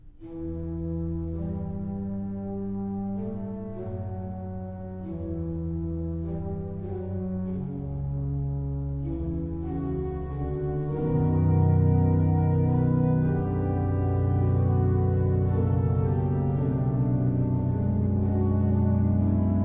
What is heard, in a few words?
Vierne Adagio, organs